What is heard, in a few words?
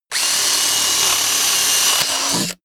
drill jingly; bohrer; soundpool